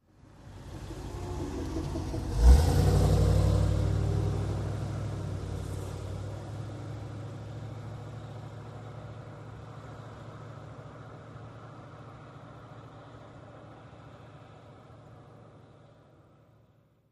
Car, By Medium Speed, Cu with Acceleration On Away.